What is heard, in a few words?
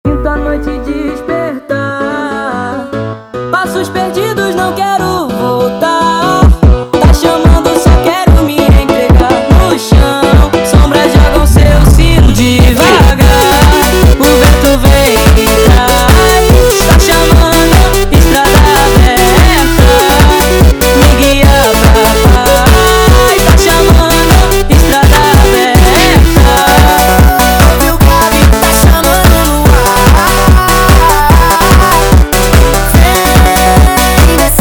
sped Up, فانک